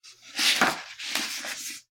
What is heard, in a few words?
page turns; soundscrate